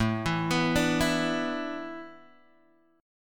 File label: A Suspended 2nd Suspended 4th, Guitar, Open G